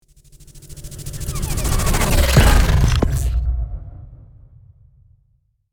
Glitch transition whoosh cinematic long

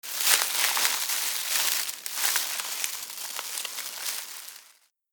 Snake slithering movement nature

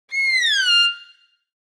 Cartoon violin gliss down

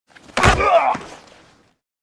American football tackle grunt

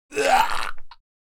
Adult male retching deep groans; Puke and Vomit; Vomiting; gfxsounds

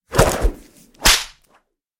Whip whoosh and crack impact

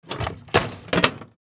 Ammunition box metal case close; Military Equipment Sound Effects

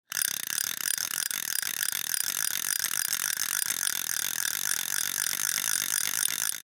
Fishing rod reel fast

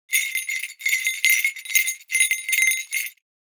Christmas toy shaking

